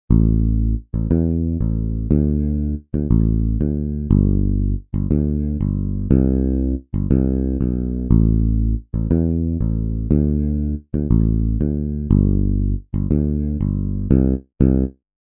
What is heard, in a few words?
Song bass